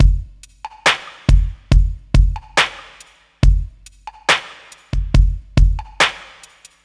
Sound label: SloMo Beats, Loops 140 BPM